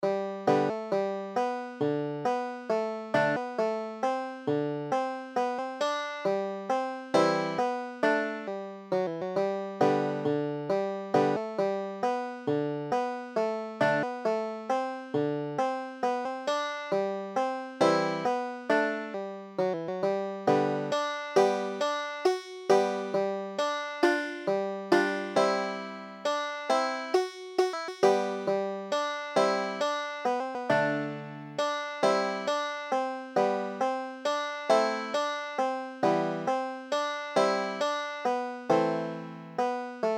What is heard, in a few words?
lilliburlero, banjo